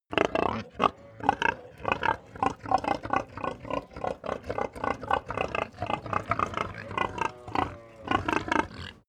pig grunt crowd busy

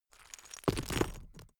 stone clatter

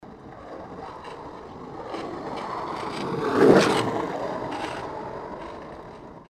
skate board passing

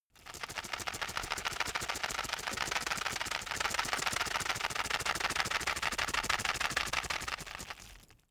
plastic drug blister shake

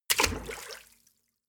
step water run in

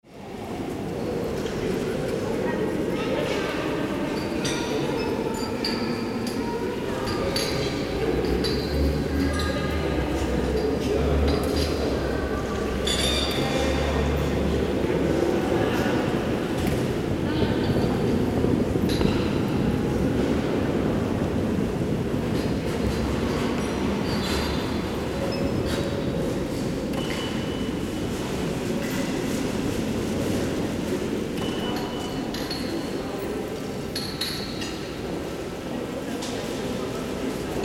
railway station gastronomy

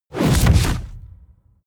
incoming explosion

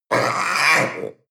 panther young scream short

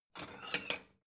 plate small take slide

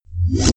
dirty swoosh

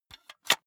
cassette case close medium

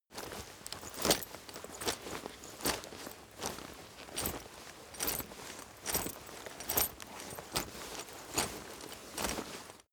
leather metal walk normal sequence

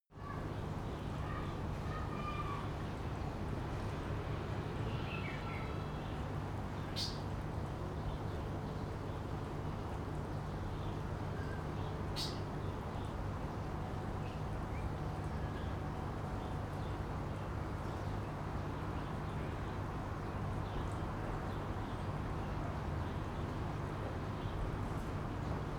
afternoon traffic water ventilation

